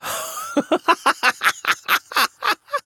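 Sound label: battle laugh; dqxi; erik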